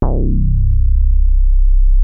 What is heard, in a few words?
DARK HARD C1, ANALOG BASS; Music Store - Claudius Diemeter Schwerlos